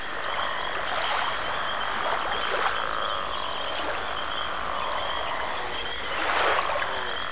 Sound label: song; waterhole